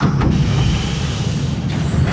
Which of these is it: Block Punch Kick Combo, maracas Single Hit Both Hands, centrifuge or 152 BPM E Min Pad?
centrifuge